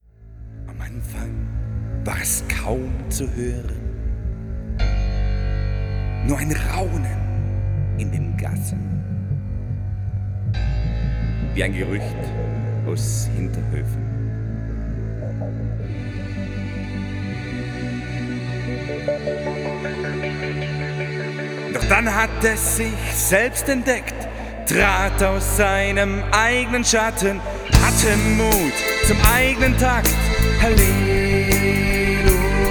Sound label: Halleluja